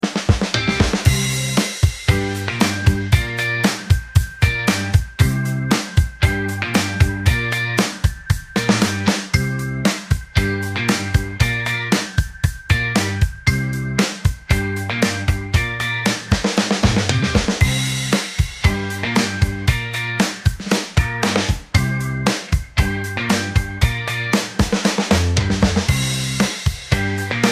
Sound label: retro groove wave